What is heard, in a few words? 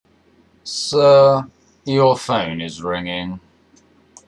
ringtone